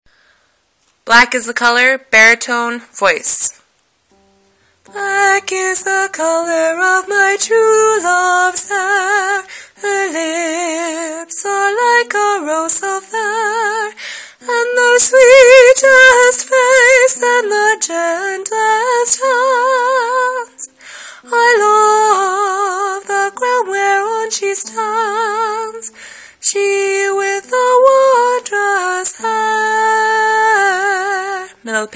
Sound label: bari voice